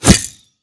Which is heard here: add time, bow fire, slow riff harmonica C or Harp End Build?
bow fire